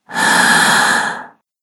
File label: Female Breath Inhale; Gasping Sound Effects